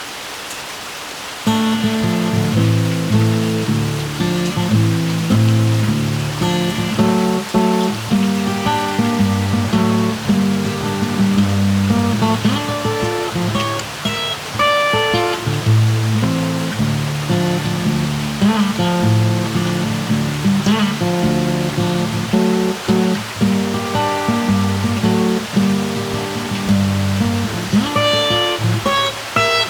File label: Blues (Heavy Rain); barnyard; playstation 2; Three Fire